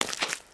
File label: Foot Mud Walk Light, footsteps